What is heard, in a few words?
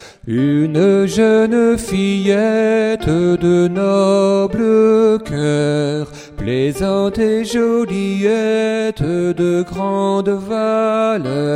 soprano; Une jeune fillette; Anonyme